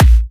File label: Electro Kicks